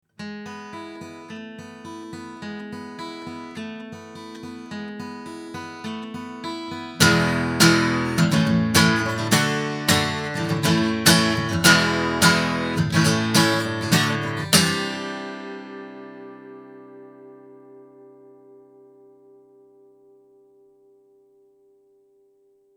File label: Shure Beta58A guitar